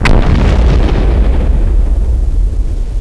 explosion water; Army Men TiS

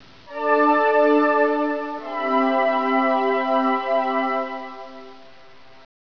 synth

